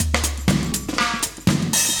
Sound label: chique 120, loops, 120bpm; Sound Effect Library